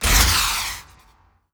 gdi mastodon rocket

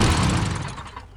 dune buggy engine stop

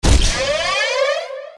stealth fire

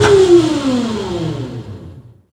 refractor stop; gdi

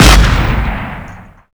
30mm tunguska fire

